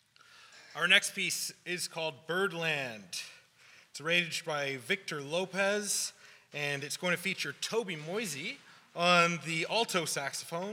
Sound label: Jazz Band; Music For a Winter's Eve 2021